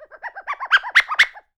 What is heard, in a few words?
chimp; Voice Crystal - Steve Reid Definitive Percussion